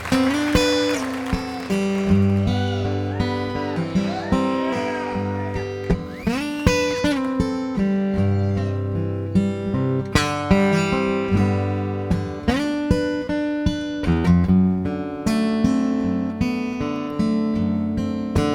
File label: Acoustic Live